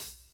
natural rap foot cymbal B key; 90s; hat samples

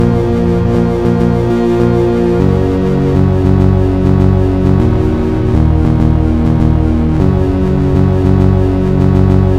chord background gloom 100 bpm; synth; instruments; loops

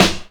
smooth steel snare drum sound g key

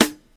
clear hip hop snare single hit d key

